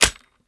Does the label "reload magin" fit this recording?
Yes